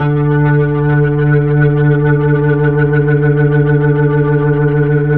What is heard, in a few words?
HAMMOND 2D L; 3D Sound; 3D Keys VOL-1